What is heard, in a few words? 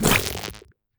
wave aoe